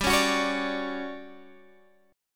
D-Major 7th G; DM7/G strummed

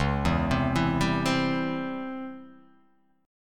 Db Minor Major 7th Flat 5th Db down; DbmM7b5 arpeggiated; JGuitar

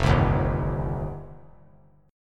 F 7th Suspended 2nd F down; F7sus2; strummed; JGuitar

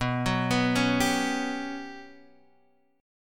G Major 7th Flat 5th

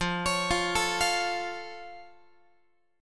F Augmented F Guitar; arpeggiated

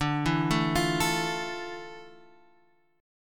F Major 7th, D; Fmaj7/D arpeggiated

